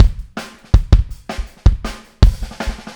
Fill Kick, Drums, 162 BPM; Drums from the Big Room The Mixes; Take Your Toms Off

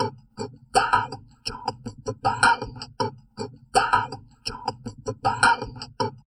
TALK, Drum Loops Crazy Processed

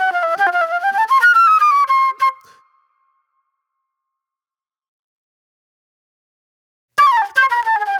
Super Soul Flute

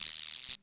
Roland CR-78 Guiro Long; classic machines; Reverb Roland CR-78 Sample Pack

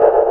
trance; Synth

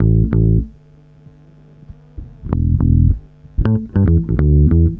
BASS, BASS GTR

